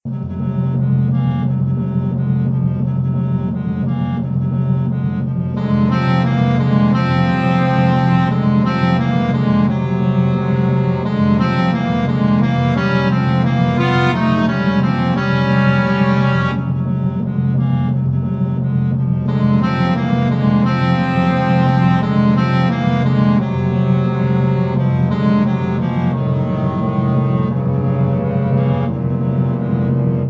battle; Skylight Freerange 2: Gachduine; PS Vita

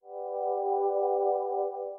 amb bonus; inbento

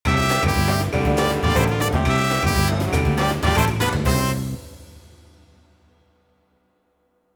pachinko fanfare

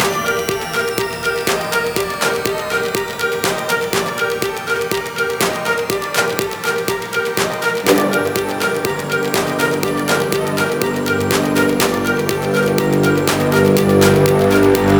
Combat Intro 4-4 122bpm

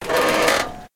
Platform Fall Creak; Puddle; puddle plus ios gamerip 2018

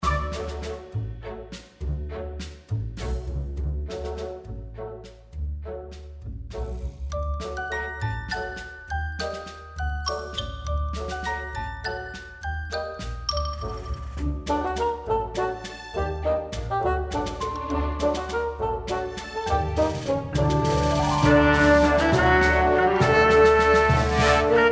Bgm Ingame

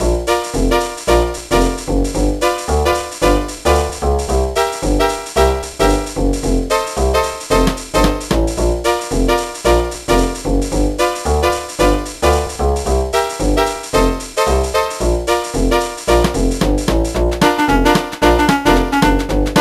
Unused Music; Ed Edd n Eddy The Mis-Edventures; GBA

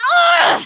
Bail Female; Kelly Slater Pro Surfer; macOS, Windows gamerip

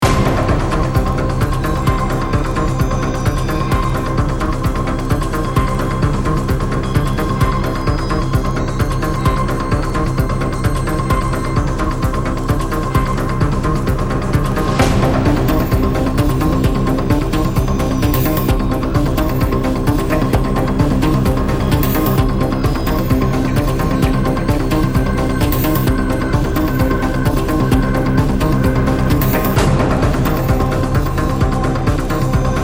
Hacking

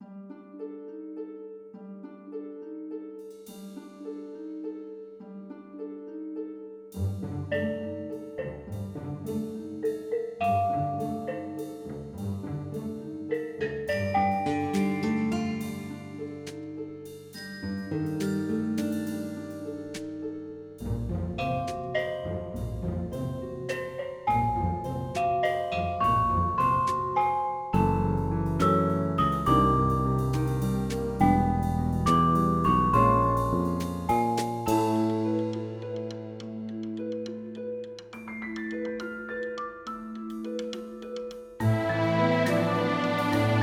music Wandering; Adventure Craft

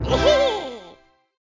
Fanfare

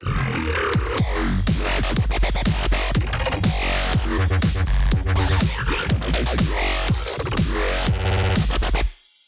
Drop The Bass

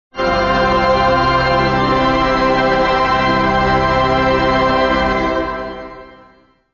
Level Up; The Way of the Warrior

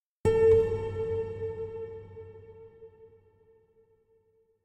Chime; chime sharp

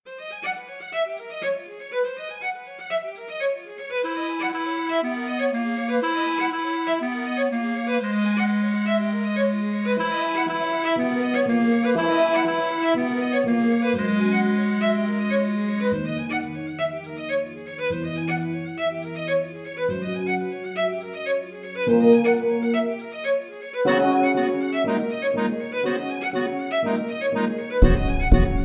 battle

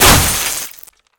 Spark Impact

